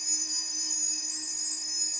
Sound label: Magic Crystal Loop; Skylanders Cloud Patrol; Arcade, iOS, Mobile